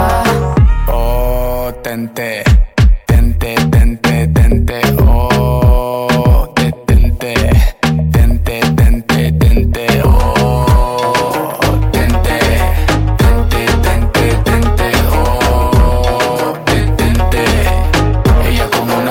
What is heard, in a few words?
Ringtone